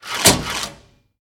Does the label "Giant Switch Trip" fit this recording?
Yes